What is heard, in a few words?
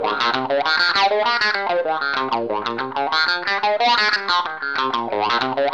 WAH SCALE, Funk Gtr & Wah; PlatinumPhattCD2; E-MU Producer Series Vol. 8 – Platinum Phatt